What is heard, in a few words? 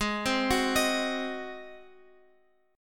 G sharp 7th G sharp, Guitar Open F; G#7; x,x,8,7,9,10; arpeggiated